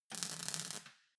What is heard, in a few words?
weld sizzle short